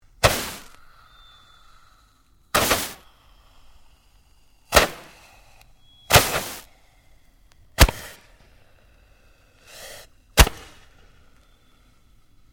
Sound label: Fireworks Launcher Multiple 8 Shot, Domestic Fireworks; Fireworks (Sound Effects:Backgrounds)